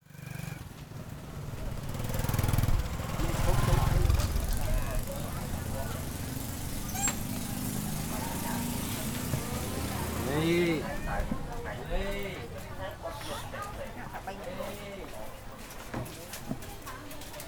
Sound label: Humans at Boueng Keng Kang market Phnom Penh; CAMBODIA; Les Cartes Postales Sonores; NOISE ON EARTH Vol1